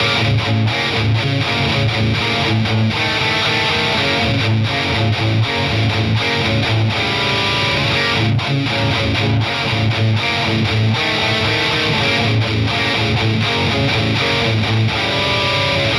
stereo doubled single guitar; Zystrix; Simply pan one track left and one track right

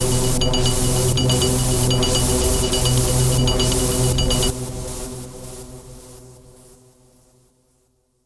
clatter pad

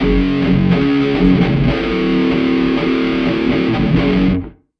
guitar effect

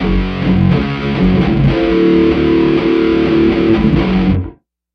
guitar effect

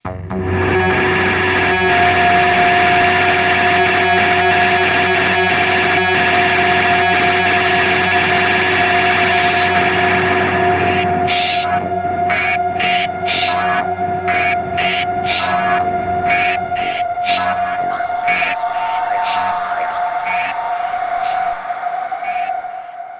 synth pads; Zystrix

